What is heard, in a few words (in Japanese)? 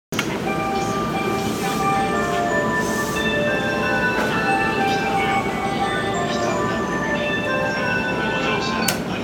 京浜東北線北浦和北, train sounds